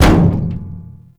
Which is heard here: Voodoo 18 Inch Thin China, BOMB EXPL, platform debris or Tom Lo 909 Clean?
platform debris